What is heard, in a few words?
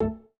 Windows Default